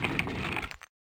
chests shulker open; Minetest; VoxeLibre

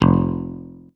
noteblock bass guitar; VoxeLibre; REDSTONE; mesecons

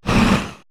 guardian hurt; Minetest; VoxeLibre; mobs mc

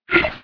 hoglin hurt; mobs; extra mobs; mobs mc

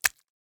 squid flop; Minetest; VoxeLibre; mobs mc

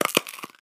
wolf take bone; Minetest; VoxeLibre; mobs mc

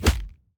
default punch

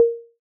amethyst walk; mcl amethyst

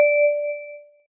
noteblock bell; VoxeLibre; REDSTONE; mesecons

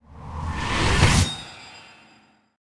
enchanting enchant; Minetest; VoxeLibre

